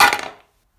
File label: Soap Drop, Foley; TRUTH-HZ; Crowdsourced Sample Pack